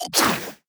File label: enemy acid basic attack